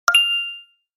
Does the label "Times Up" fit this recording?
Yes